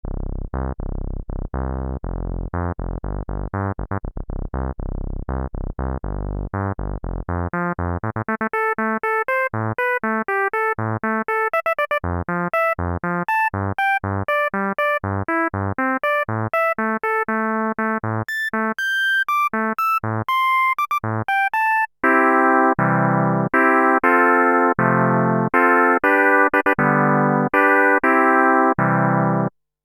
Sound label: e-mu virtuoso 2000 filter lpf 12pole q000